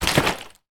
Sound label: household water spray bottle shake water inside